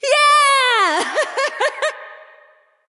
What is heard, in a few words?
nv yay; smite; awilix